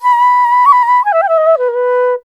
INDIA ALTO; Spectrasonics; Heart of Asia; IND ALTO PH

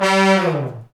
BRS, Fat Falls; Roland LCDP06; Fat Section

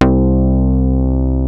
OSCAR BASS, OLD SYNTHS; Rubber Chicken Software Sample Bank

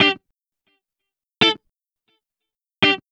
170 G, Guitars; Total Drum&Bass; 90 s Sample CDs

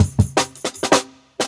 Jungle Breaks; Original Jungle Breaks

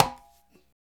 ETHNIC PERC; Roland - LA Composer vol. 1

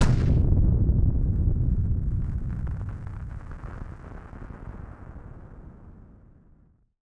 Firework FX; musicradar; bomb factory

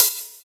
Hhat, Hihat; Equipped Music; Loungin House

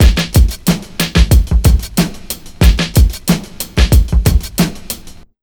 92 BEAT, Pure Electro, BEAT MIX

